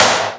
Claps; Vengeance Samples

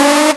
Synths D; Vengeance Samples

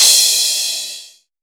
Cymbals crash; Vengeance Samples

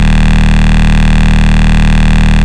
Root D#, Bass

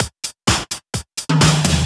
Fill Ins 128BPM; Vengeance Samples; VEH2 Loops

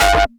Synths G; vengeance essential club sounds-3

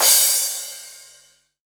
Crash, Cymbals; Vengeance Samples; VEH3 Cymbals

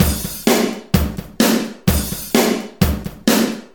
House Beat, 128 BPM

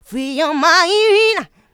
voc, female vox, Vocal House

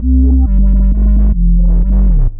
Roland B Line, Roland S100

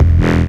Synth Bass; Vengeance Samples; VEE Synth Shots

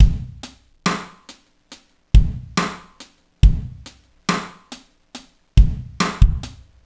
Slomo Beat 140 BPM, Loops, Slow Motion Beats

